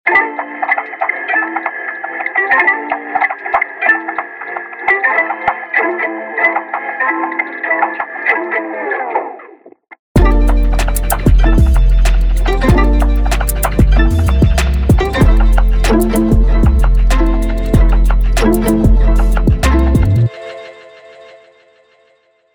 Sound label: lofi hip hop beats